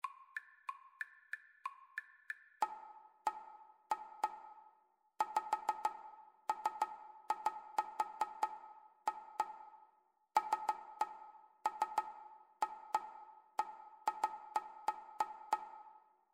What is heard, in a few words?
rhythmic dictation; ear training